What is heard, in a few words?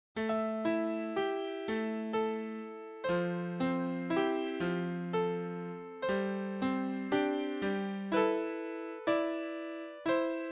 piano melody solo